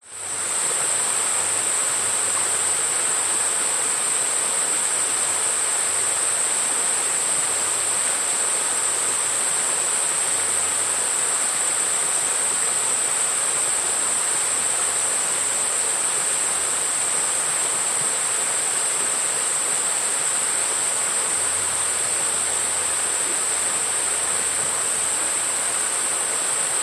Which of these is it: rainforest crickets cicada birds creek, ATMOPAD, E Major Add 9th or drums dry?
rainforest crickets cicada birds creek